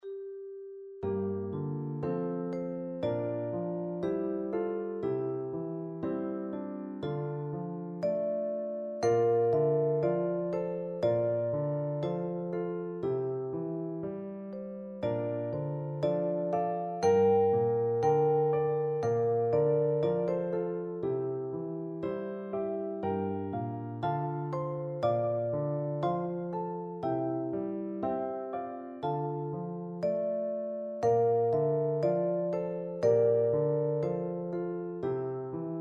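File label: wondrous vibraphone; percussion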